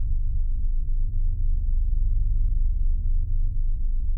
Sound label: Refinery